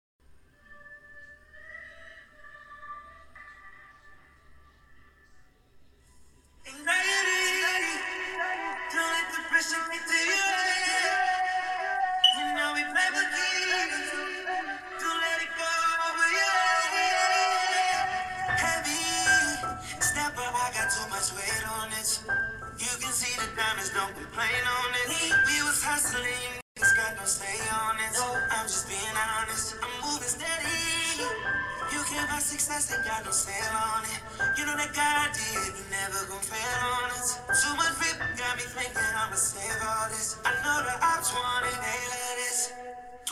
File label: anxiety full speed w vocal